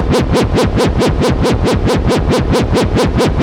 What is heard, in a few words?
Panning lasers SFX